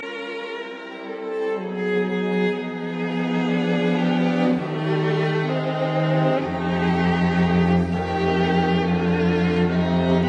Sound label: String Quartet in E minor Adagio molto; Bridge; Bologna